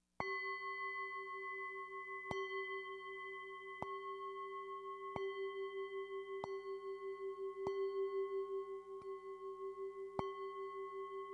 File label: Random Spacious Bells and Drum